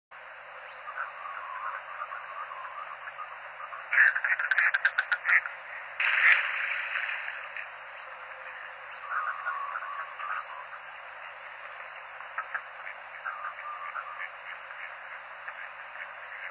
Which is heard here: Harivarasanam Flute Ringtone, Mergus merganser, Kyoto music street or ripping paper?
Mergus merganser